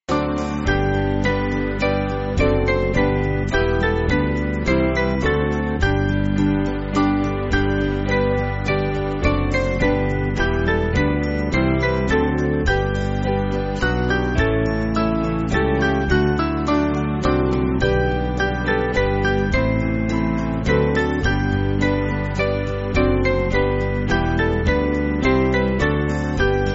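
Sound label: Band, Backing Files for Churches; The Great God; A Virgin Unspotted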